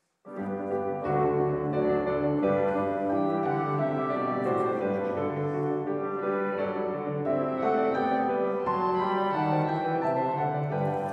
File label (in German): Glocke Konzertchor